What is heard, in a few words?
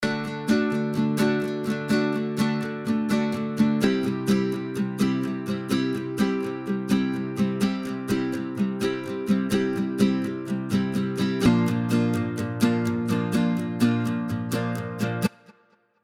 XP 2 Nylon Floating Chords, guitars; Avenger Guitars